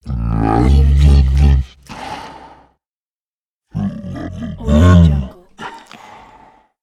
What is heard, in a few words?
big creature grumbles